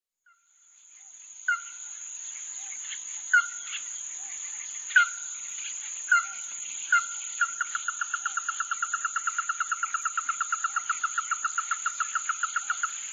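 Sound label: Ruddy-breasted Crake; hikuina; watarase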